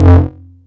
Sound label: Kick, Nord Modular Drum Samples; Yawgmoth